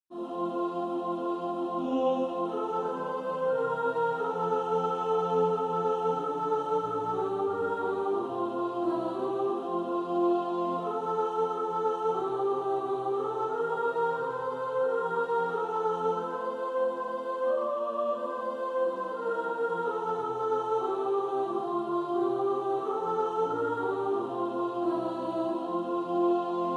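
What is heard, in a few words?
soprano, practice music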